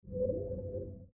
button; starwars; episode vii the force awakens

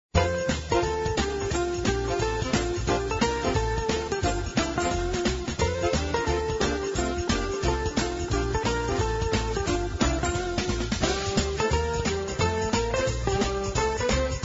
music; prep and landing; kringle academy

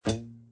bottle cork; snow white

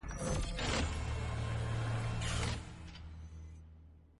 menu appear; avengers

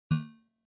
Slinky hit wall; toystory

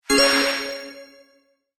bonus

